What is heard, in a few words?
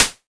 hihat; hyperfocus dsp